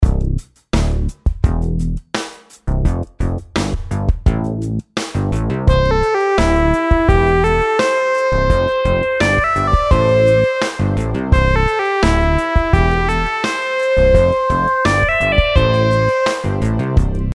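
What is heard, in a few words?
melodies simple 8s triplets C major; melody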